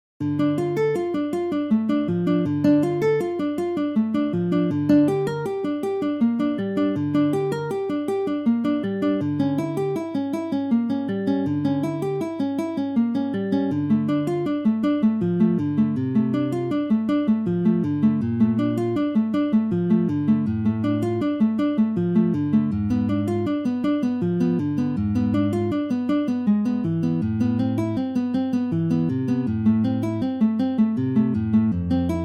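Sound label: bach prelude in c minor guitar; Little Prelude in C minor BWV999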